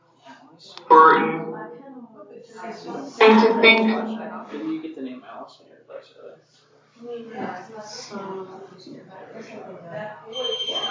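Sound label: Train Station Phasma Box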